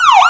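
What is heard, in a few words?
yelp, siren; vcmod; els; sa-430m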